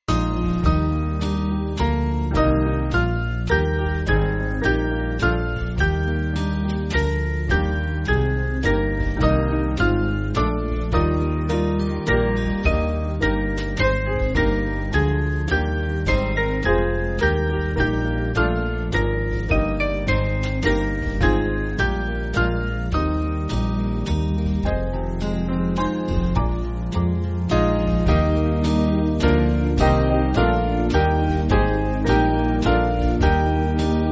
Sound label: Band; Irish